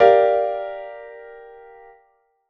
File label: G minor minor 7th